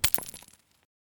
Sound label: grenade gravel; STALKER; IWP